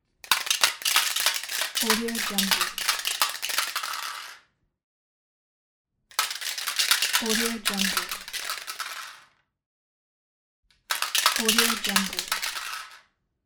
cocktail shaker

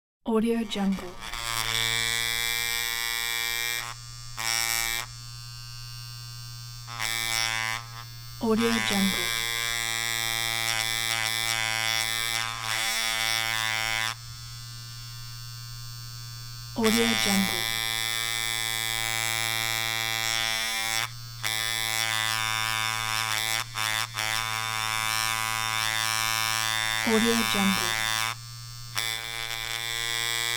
small shaving machine

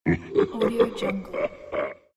halloween laugh

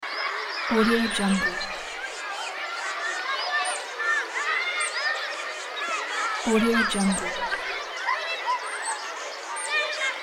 children playing outdoors; kuksaudio

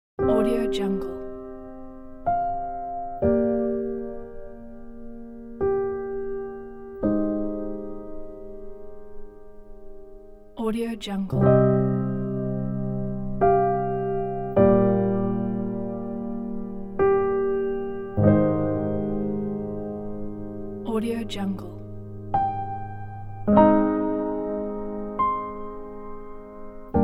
sad piano

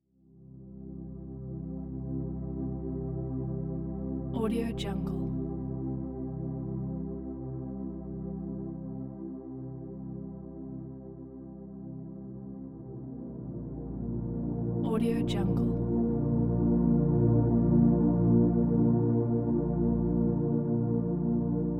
ocean drones ambient

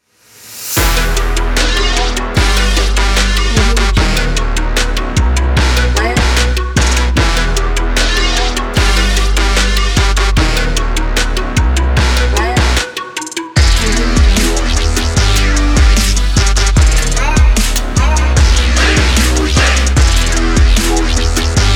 for trap

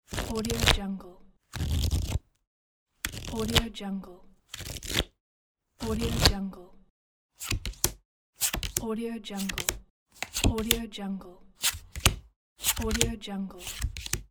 cards

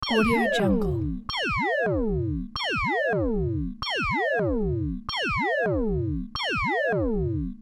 robotic system malfunction

